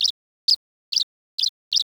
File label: Tree Swallow trit s; birds